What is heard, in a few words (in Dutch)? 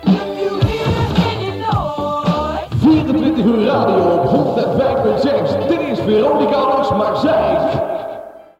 Jingle; 24 uur radio; Station Calls